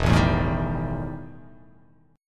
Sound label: E Minor Major 7th Double Flat 5th E; strummed; JGuitar